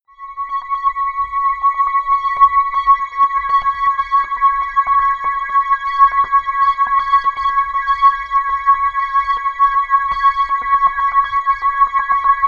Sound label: high rhythm; flaechen